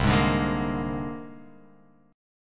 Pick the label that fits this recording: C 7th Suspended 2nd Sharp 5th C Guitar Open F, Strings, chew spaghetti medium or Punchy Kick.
C 7th Suspended 2nd Sharp 5th C Guitar Open F